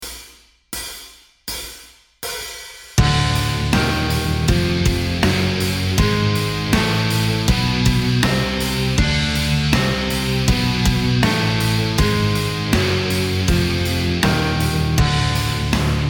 Exotic Modes D Diminished Half Whole Relative D Major; metal music theory